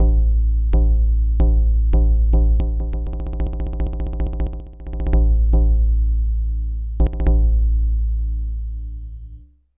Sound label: Drum Roll; Drum Roll 1 (Amakusa Demo)